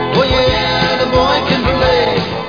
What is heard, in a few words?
open; Chess